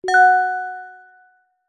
multimedia alert notification basic bell synth positive ping; zapsplat